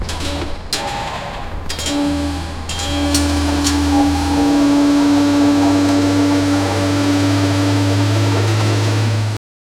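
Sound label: realistic construction site, machinery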